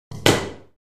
Ping Pong Paddle Set

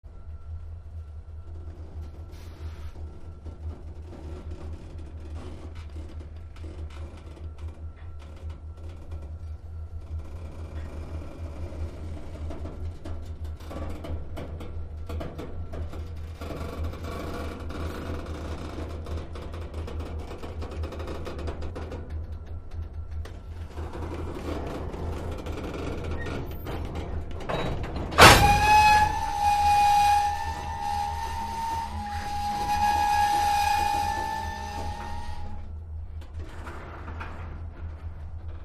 Train Freight Train, freight trains

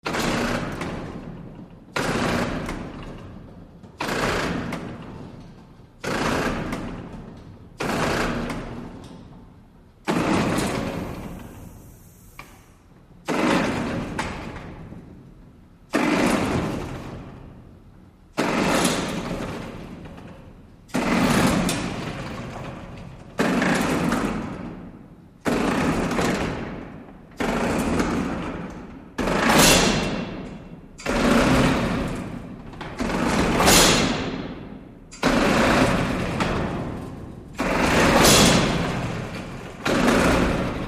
Freight Elevator Doo

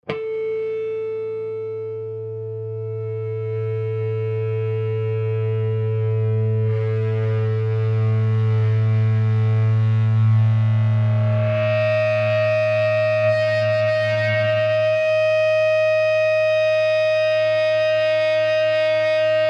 Elect Guitar Feedbac, guitars

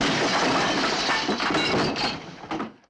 Barricade Crash Woo, debris

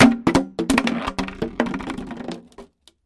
Plastic Gallon Jugs

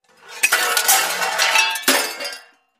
Junk Thrown Junk Pile, junk crashes

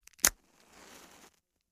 match strike; lighters & matches

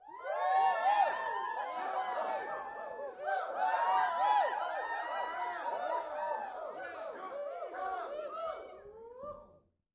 Crowd 20 Rowdy Short, small int

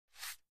Card Pick Up Slide; casino games

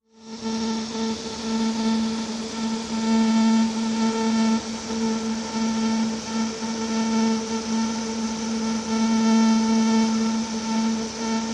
Fly Radio Machine, static

